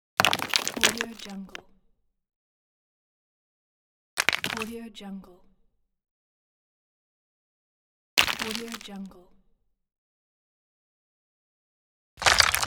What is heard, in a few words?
stone